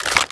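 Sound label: Inspect Movement; valorant; reaver vandal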